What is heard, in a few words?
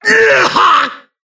Taking Damage; BBCF; Susanoo